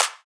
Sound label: EDM Future Clap